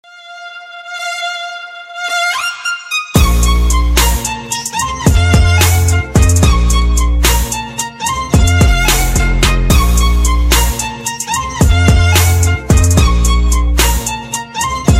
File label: instrumental ringtone